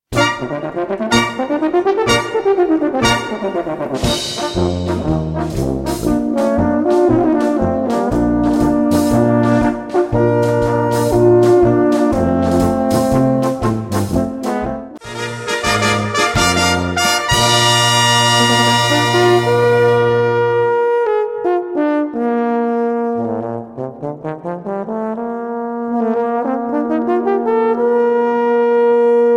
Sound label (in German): Baritone in der Nacht